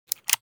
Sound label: Camera handling sd card insert clicking